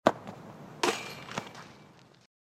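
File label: shot into the net; Звуки футбола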